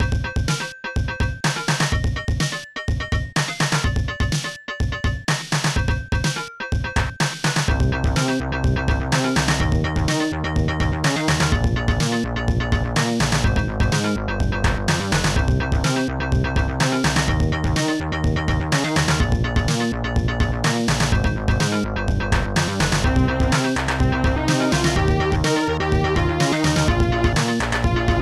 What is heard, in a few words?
hymn